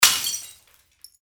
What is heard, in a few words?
glass breaking